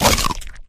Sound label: infector bite; halo; flood; infection h3